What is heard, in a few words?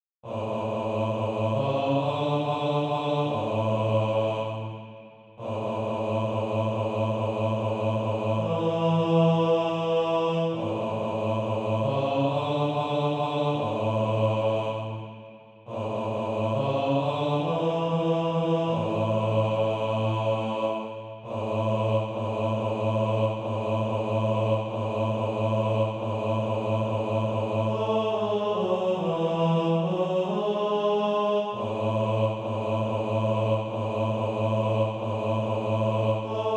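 bass, Practise Music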